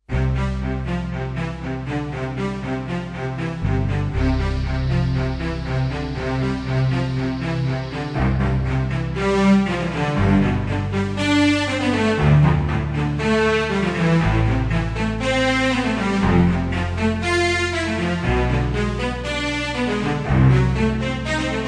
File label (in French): harmonie mineure